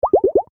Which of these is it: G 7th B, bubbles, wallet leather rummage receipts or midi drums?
bubbles